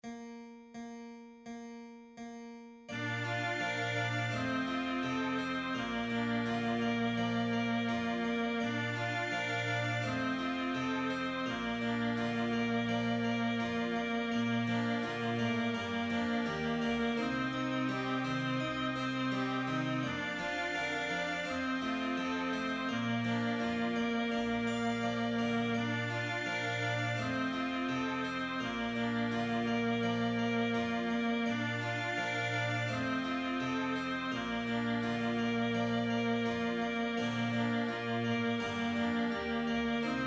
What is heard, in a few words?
Hot Bundt Cakes Duet